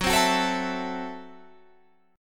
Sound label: A Minor 6th F sharp